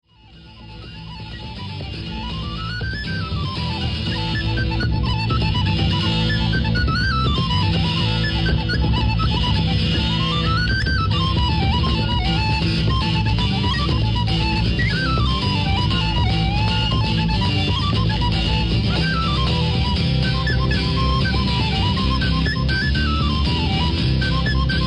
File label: Penny Whistle live on radio; Bagpipes